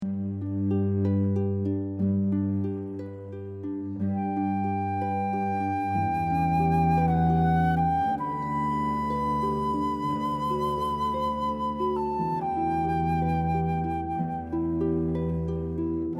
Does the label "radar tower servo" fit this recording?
No